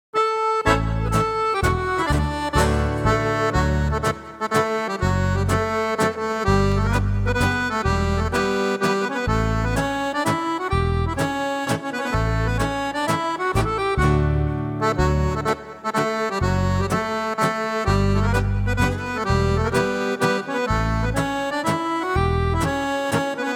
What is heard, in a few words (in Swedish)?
polska